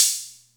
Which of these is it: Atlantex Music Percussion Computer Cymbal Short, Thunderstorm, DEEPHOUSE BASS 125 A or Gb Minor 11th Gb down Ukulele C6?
Atlantex Music Percussion Computer Cymbal Short